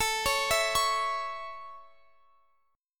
A Major A, Guitar Open F; arpeggiated

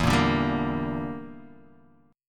E Minor 7th Flat 5th, Guitar, Half Step Down

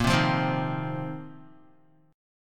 Bb Suspended 2nd Suspended 4th, Guitar; Bbsus2sus4 strummed; 5,3,5,5,3,x; JGuitar

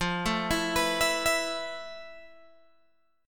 F Major 7th Flat 5th, Guitar Open G; 15,14,14,16,17,14; down; chord shape

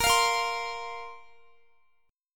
Bb Suspended 2nd Bb down Guitar; Bbsus2 strummed

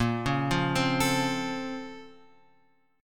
A sharp Minor Major 9th; A#mM9 Chord {6 4 3 5 X 5} arpeggiated

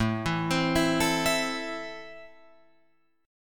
A Minor, Guitar, Open F; JGuitar

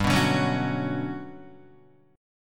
G Major Sharp 11th, Guitar; strummed; JGuitar

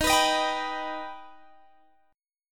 Eb Augmented Eb, Guitar Open G

